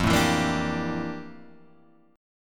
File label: F Major 13th, chord; strummed; JGuitar